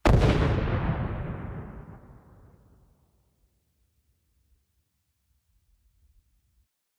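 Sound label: time bomb explode distant; Original TK Sounds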